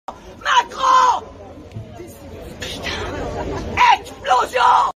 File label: putain explosion; macron; audio meme